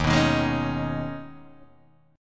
D sharp 7th Suspended 4th Sharp 5th D sharp, Guitar, Open D